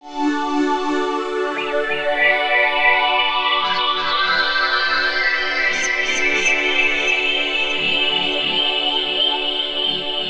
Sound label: moog